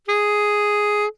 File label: A sharp 4, soprano saxophone